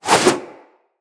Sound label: Generic Backflip Whoosh, Generic Foley; Characters